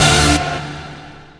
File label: Stab; DOA breaks; Old Schools Stabs